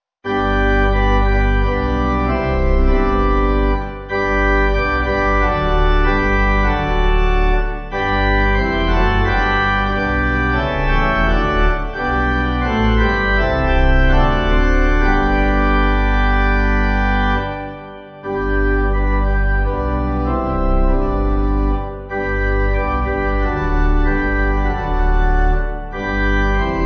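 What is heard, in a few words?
Pipe, Pipe Organ; And Let Our Bodies; Canada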